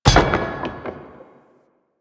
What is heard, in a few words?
Debris Metal Stone; Warframe; Ambience; Entrati